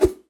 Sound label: swing